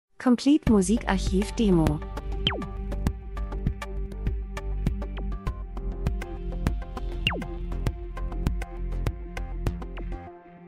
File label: drums; Variation Elektro Pop Rhythmus Lifestyle Positive Stimmung Urban Club Abend Feiern R & B Industrie Dokumentation